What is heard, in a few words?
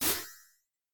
Sorc grab